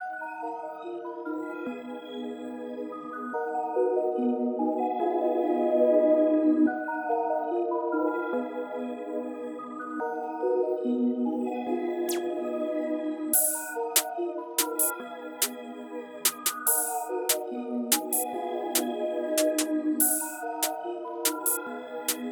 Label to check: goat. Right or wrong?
Wrong